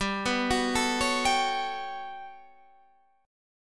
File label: G Major Sharp 11th G, chord